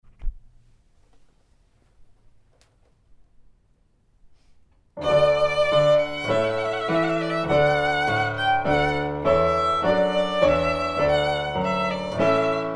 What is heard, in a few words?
Violin Keys; Violin & Piano (Keyboard); Wedding Ceremony; Ceremony Musicians